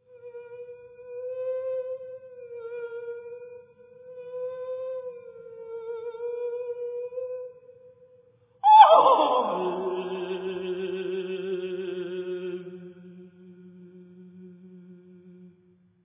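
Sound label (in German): Stücke für Stimme Solo; Oekumenische Vesper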